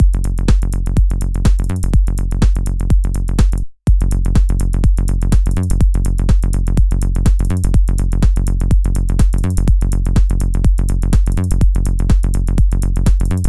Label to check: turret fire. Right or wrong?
Wrong